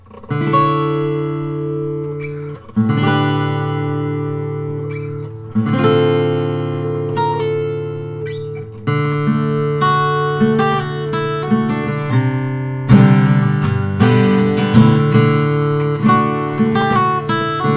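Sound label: Kinscherff Troubadour Brazilian rosewood acoustic guitar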